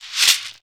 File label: drag, Afro Shakers, Shekeres; Roland LCDP14 Africa VOL-2